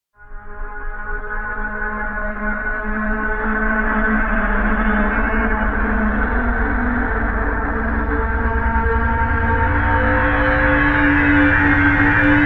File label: Aerial Rise No Whispers; Ninja Tracks